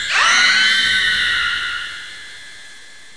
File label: screams; Dr. Windows